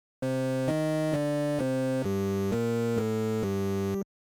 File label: Arcade game 16 bit or 8 bit mission failed game over; Game Over Sound Effects; the sound of losing a life